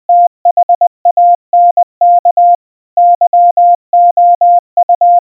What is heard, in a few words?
THANK YOU, Морзе; Благодарность на морзянке; Генератор азбуки Морзе